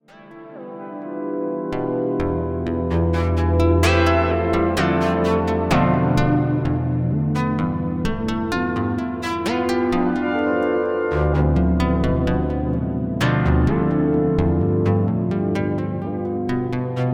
detune and harmony CV, 32 Voice String Synth Eurorack